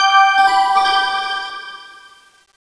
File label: gotallgems; MBHaxe